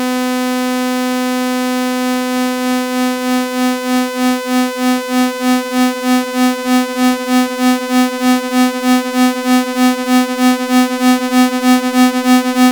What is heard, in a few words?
SRM LFO